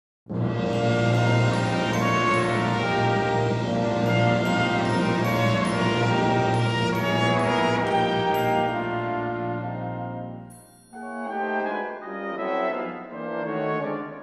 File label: brass